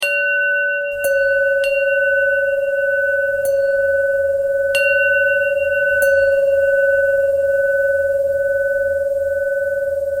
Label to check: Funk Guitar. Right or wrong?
Wrong